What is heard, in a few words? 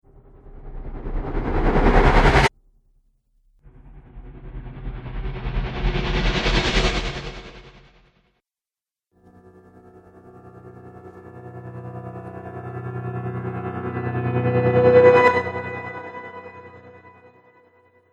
Cinematic Riser Pack; Rise And Dive Sound Effects